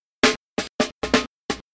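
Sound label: Rhythm Breaks Loop snare drum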